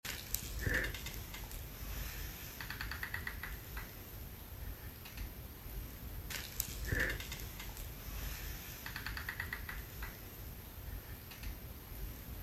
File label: tree creaks